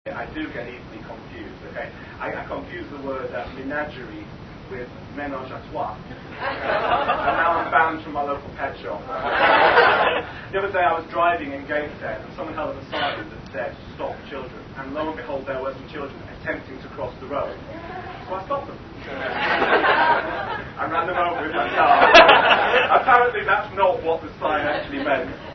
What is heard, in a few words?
confused